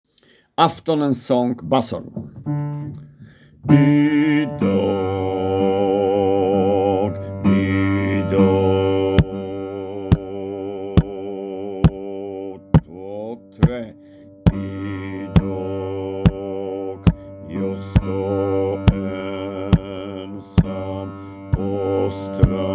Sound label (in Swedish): bas